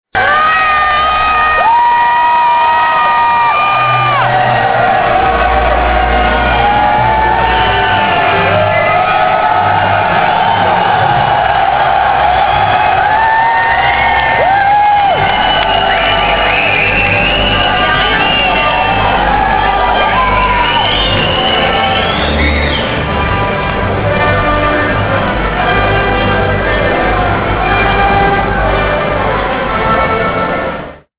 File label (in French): applaudissements